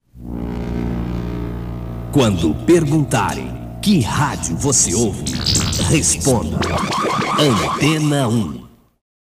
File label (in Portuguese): Vinheta; Antena 1 SP